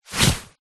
foley clothes running shorts polyester single leg move walk movement